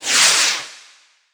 Fireworks Fly; angry birds rio

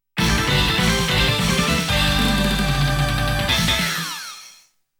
VICTORY OR DEFEAT; muscle bomber the body explosion

